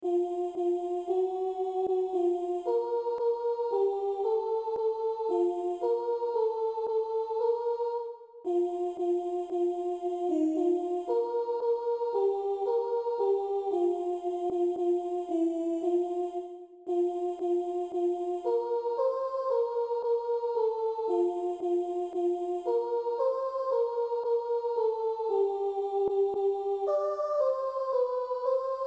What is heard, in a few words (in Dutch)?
sopraan midi